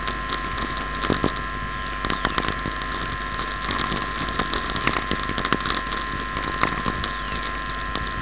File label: whistlers, VLF/ELF